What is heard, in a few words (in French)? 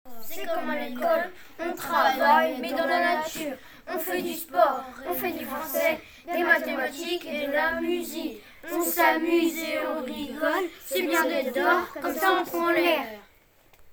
paroles Rap